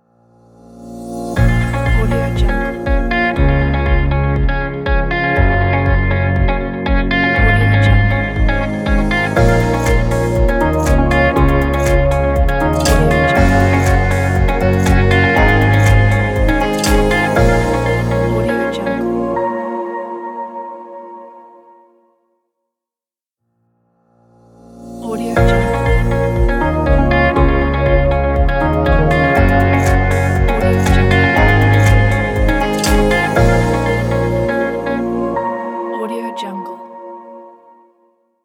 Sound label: inspiring logo